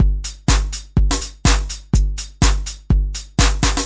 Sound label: 124 bpm raw, drum loops and breaks